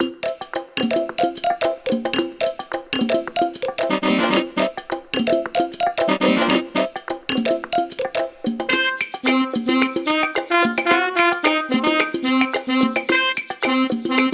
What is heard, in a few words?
Marimba jazz; CASUAL MUSIC; FREE CASUAL MUSIC PACK; FruitMaster